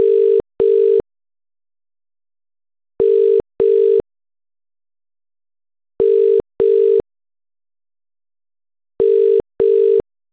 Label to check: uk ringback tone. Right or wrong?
Right